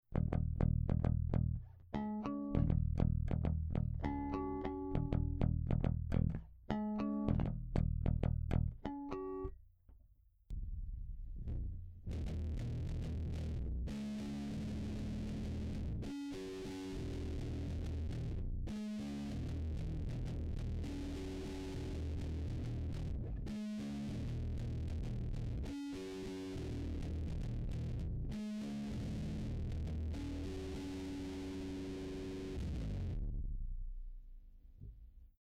Industrial Drive; Fuzzmo Ltd

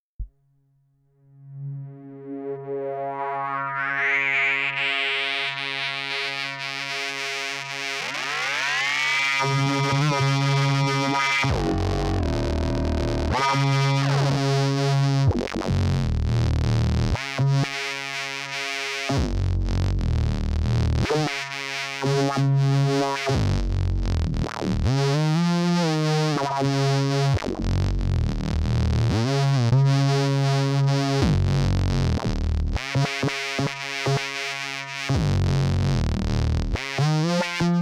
Liquid Bassline Aftertouch HP

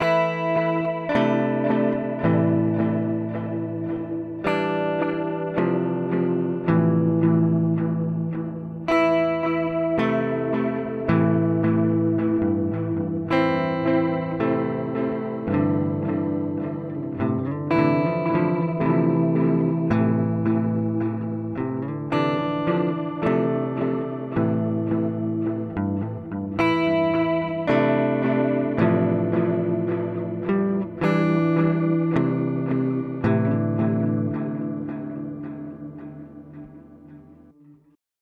Amplitube X-Time Space Echo

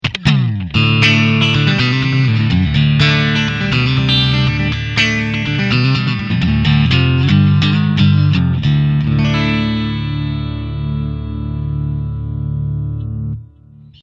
Country; Fake

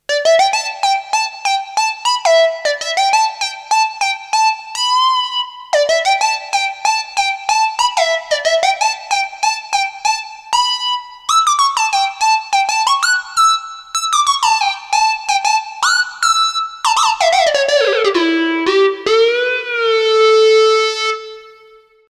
Arp Quadra Pulselead

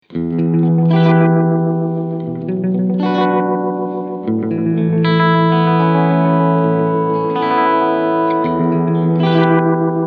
Short Delay; XVive Echoman

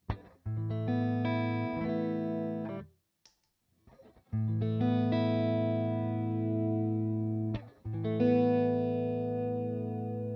Halo Chorus

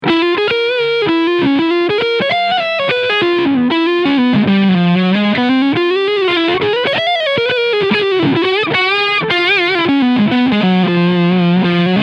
Fusion T 25th Firemist Leadsound neck humbucker; Harley Benton

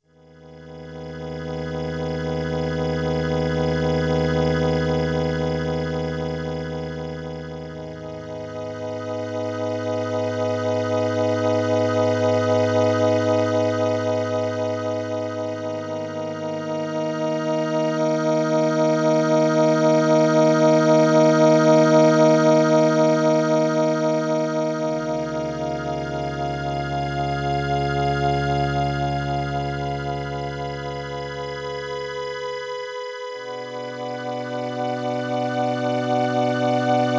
dry strings

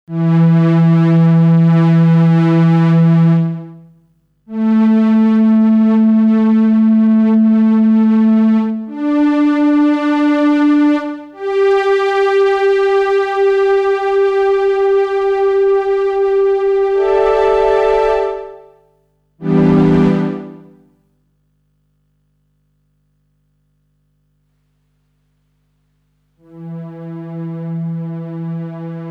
SE50 Verb; Well Known Verb

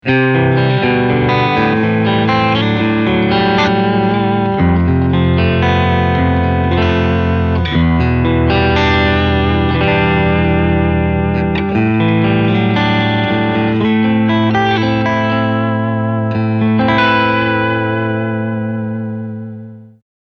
Clean Channel Crunch

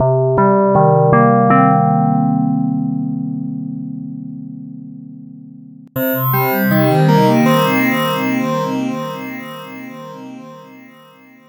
Sine Driver

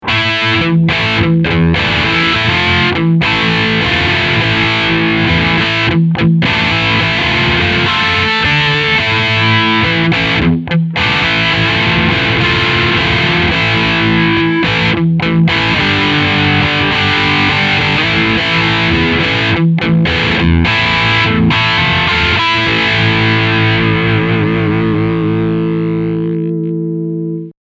Med Gain

